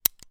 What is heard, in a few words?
gun 1911; weapon; still north media